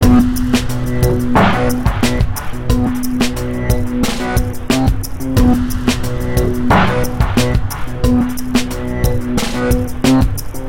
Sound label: Result Lose; ACR Drift